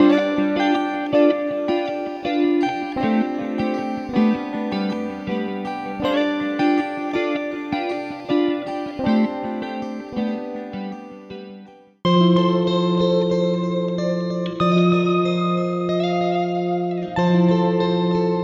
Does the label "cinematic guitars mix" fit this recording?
Yes